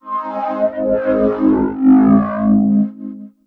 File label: SE OVERD., Overdrivin'; E-MU Producer Series Vol. 6 – Analogia Project; Strictly Synthe; SE-1